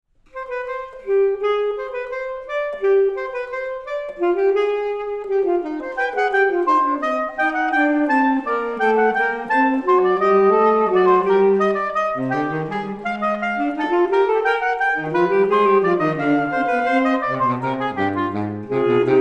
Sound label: fugue in c minor